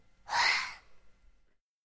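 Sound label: baby yawned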